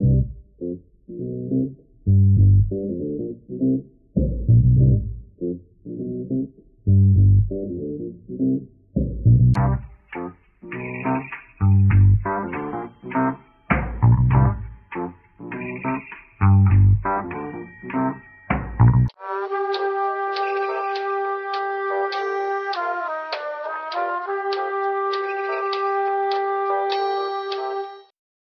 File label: keys and flute, loops, phonky